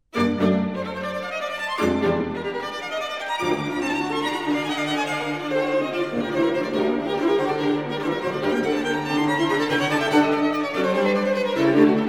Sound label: string trio